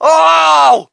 crowd, shout; Mike Tyson Heavyweight Boxing

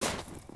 FS Snow, footsteps

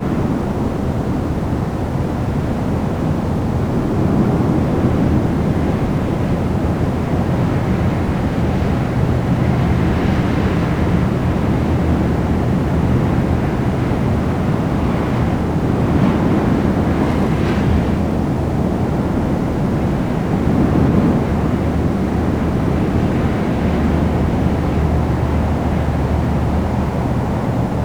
Wind light; ambient; Project Gotham Racing

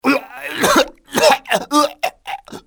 choke, pain death, male; Soldier Of Fortune II Double Helix; XBOX

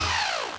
servo, droids robots

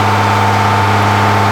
Truck

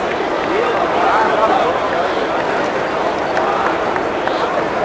Idle, Crowds; XBOX; AND1 Streetball

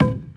wood hit; Splashdown; XBOX; Impact

